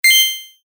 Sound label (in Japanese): アイテム入手 レトロ; モノラル